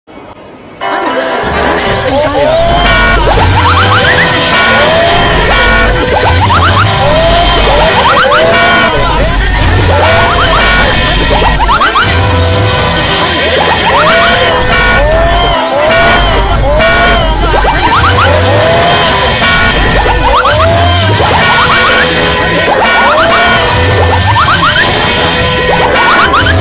ahh sounds

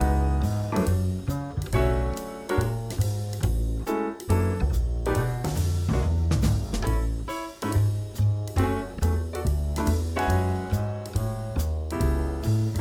Hotel Jazz Music

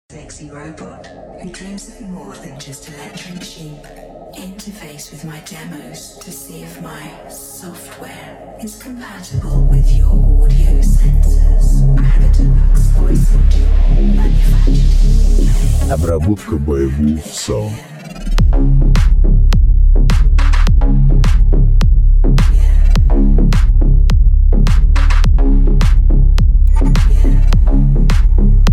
33 - 37 hz Clear

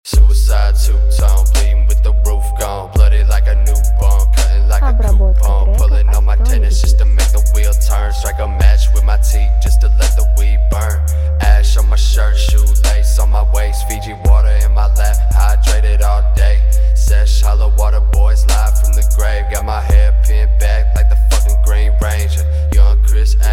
Bass; Rompart Range

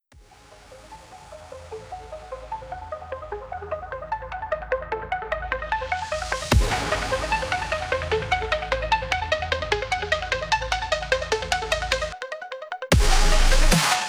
Cool Groove

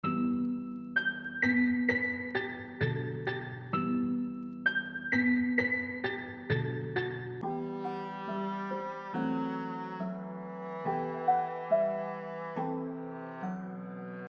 TRAP; Sample Box

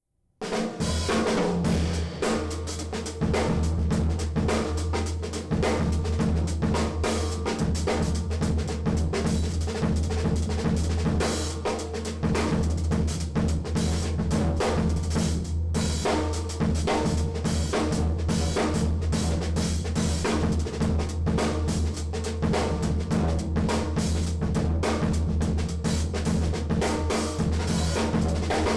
lo-fi funk groove

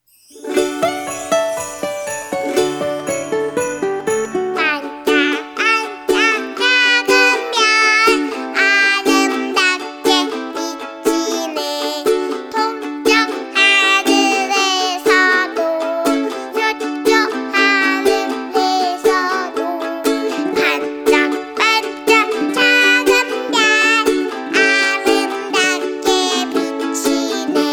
Ukulele Band

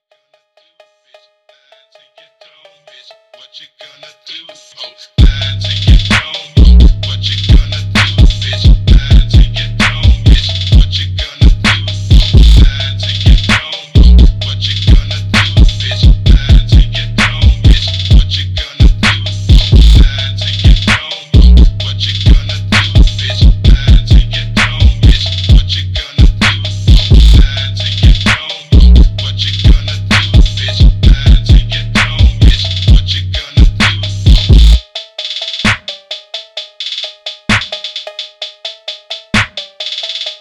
Bass Boosted

